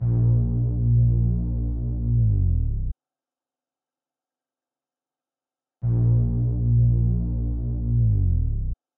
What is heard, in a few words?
Bass, 165 BPM A# Min; Loop Stems; Melodies; Cymatics; Domination; 2022 Melody Mystery Expansion